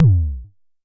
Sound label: Jomox MBase, drum machine; Jomox MBase 01